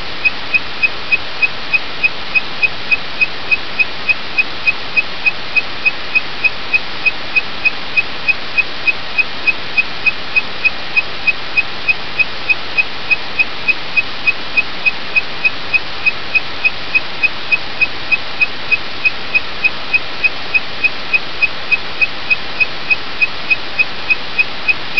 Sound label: door bell receiver